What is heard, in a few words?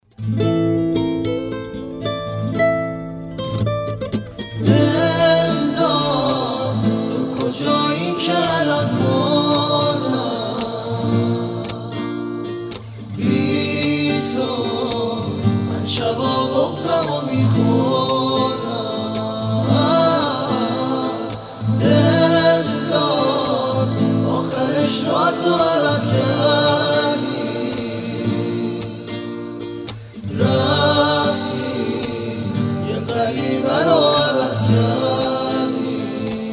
Version Guitar